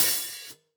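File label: close Open Hat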